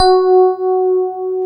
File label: PIA DX E-PIANOS